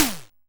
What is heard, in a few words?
Ym Multi Tom E1; multi-samples; CHIPSHOP DELUXE